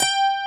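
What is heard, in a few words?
ACOUSTIC GUITAR; Pro-Rec - Synthsonic; BASSES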